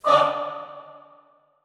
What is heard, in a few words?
FAH D3 R, Extended Classical Choir; Best Service